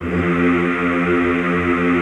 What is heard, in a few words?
Classical Choir, F1; Best Service; Extended Classical Choir